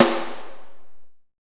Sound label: KOMMERZDRUMS